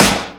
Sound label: snare